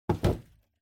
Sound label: Cardboard Box Put Down Empty; ダンボール; 置く 空; 梱包材